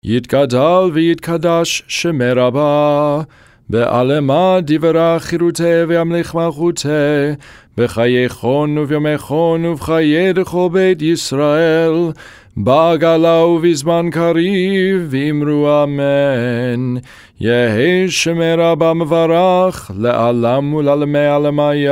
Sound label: hatzi kaddish after reading torah weekday minor